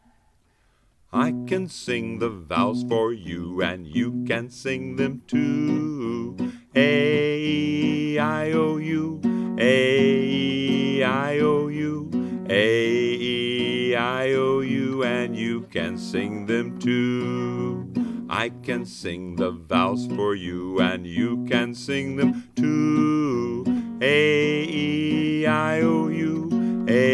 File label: Vowel Song Guitar